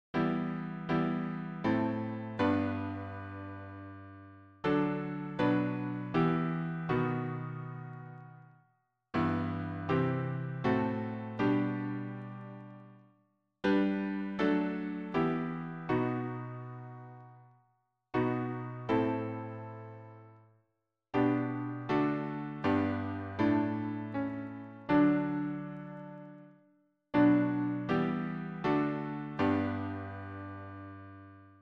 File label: harmony piano